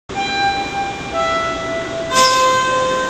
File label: door close